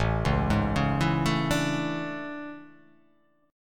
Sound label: A Major 11th, down; AM11; arpeggiated; JGuitar